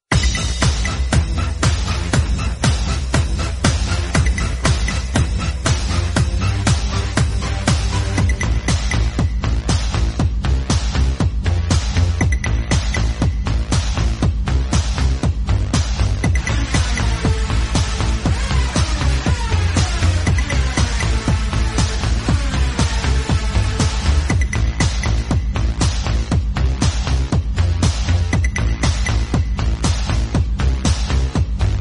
Instrumental Song

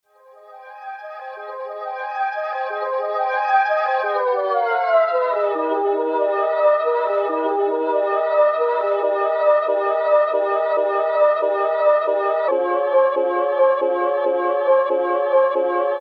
synth at ambience part

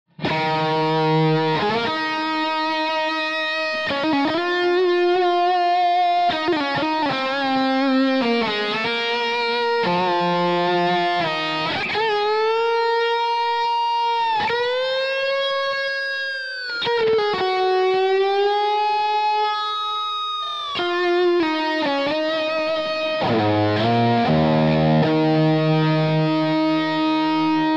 fake feedback

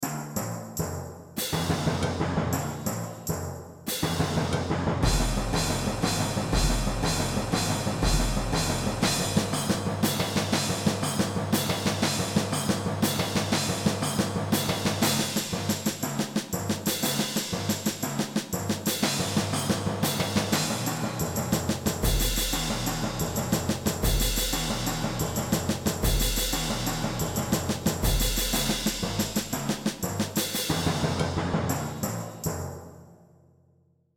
Drums

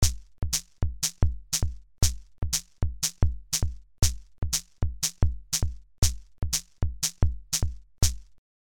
5-4 poly

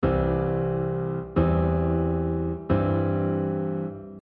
C maj inversions

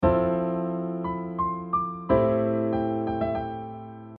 chord sub - Am

